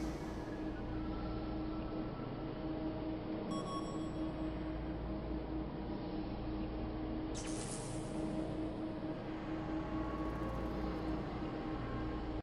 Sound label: ambience